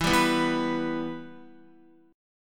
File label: E-Minor, Guitar; strummed; JGuitar